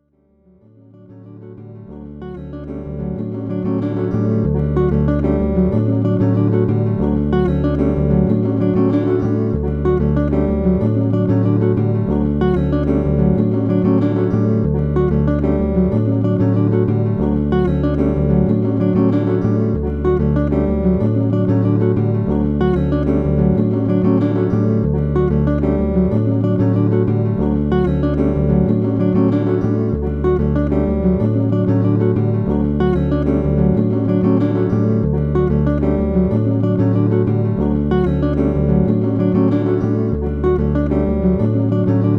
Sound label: loop